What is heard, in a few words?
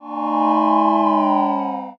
cheer far